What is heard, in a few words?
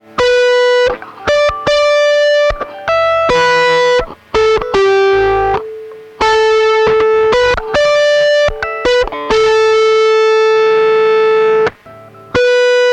higain lead